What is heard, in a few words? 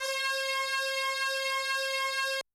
Brass, Yamaha DTXpress-II